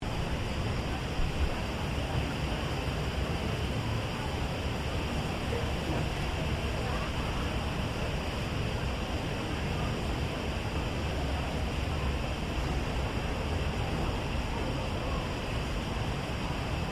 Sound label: Flame Gas Giant Gas Flow Loop, giant industrial gas flame jet; Flames; Melbourne Casino; Objects